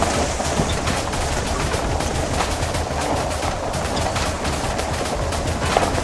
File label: shoulder dirt in; Blind Drive